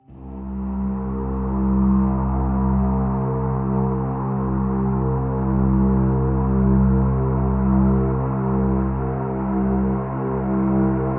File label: Not So Simple Low Drone